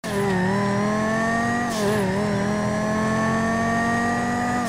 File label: idle, car sound